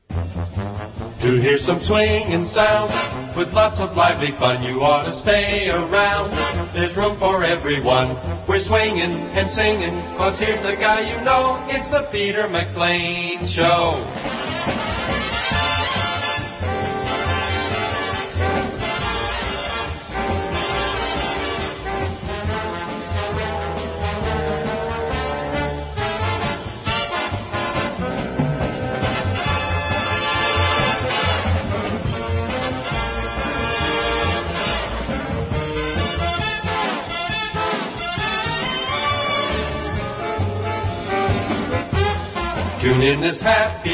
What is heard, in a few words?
jingle; crc